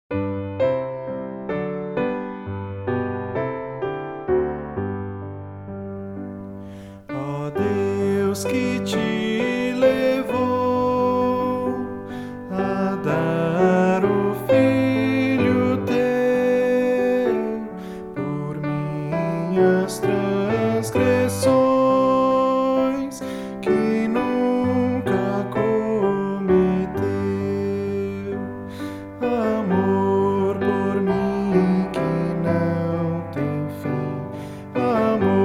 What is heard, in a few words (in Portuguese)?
voz piano